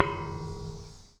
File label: Japhet Creek Sign, percussion; HOUSTON; Fifth Ward